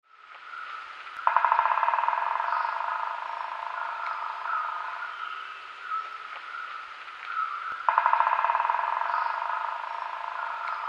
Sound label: Crimson backed Flameback drum; Sri Lanka